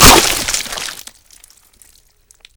chop, Gore

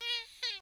fox, animals

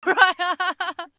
female laugh; Voices Emotes

